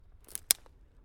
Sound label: Twig Snapping